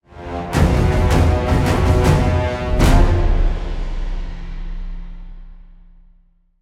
Combat High Threat Intro Short

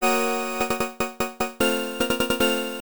High Score Fanfare; Motos; x68000

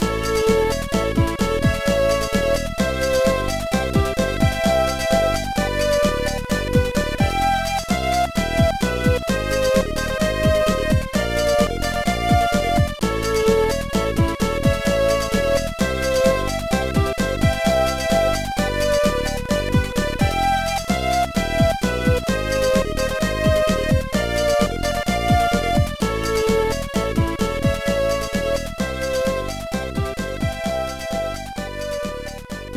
Battle Lose; Charabom; bomberman max 2; gba

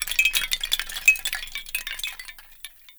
Foley Jingle Long; gamerip; Hellpoint

